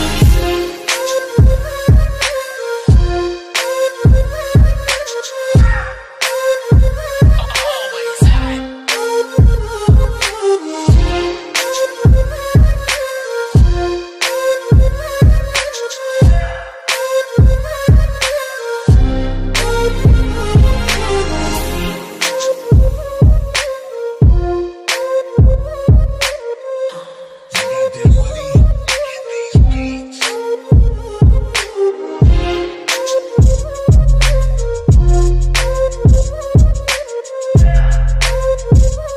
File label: Arabian Night Instrumental Ringtone; ringtones